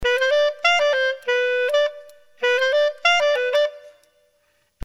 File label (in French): clarinette son